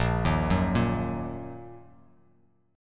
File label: Bb Suspended 4th Sharp 5th Bb down, chord; arpeggiated